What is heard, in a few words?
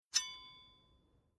Sword Old Bayonet Long Light Metal Ring Out; Late 19c; Weapon